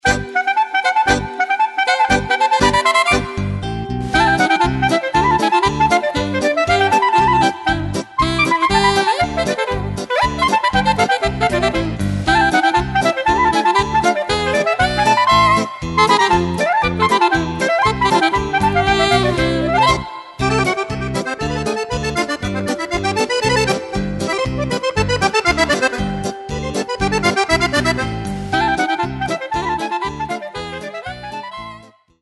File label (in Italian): Clarinetta